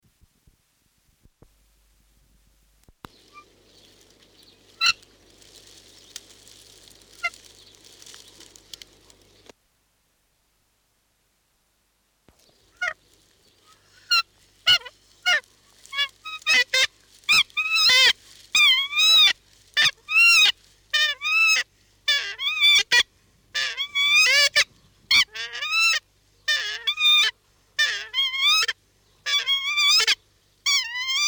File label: takahe song; conservation, native animals, birds